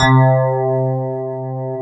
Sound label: FM ELP 6 C2 R, Keyboards Old School; USB Soundscan vol.09; AKAI